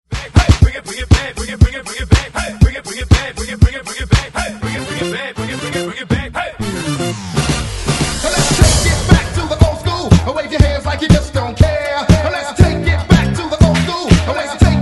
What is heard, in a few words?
70s 80s And Rock Megamix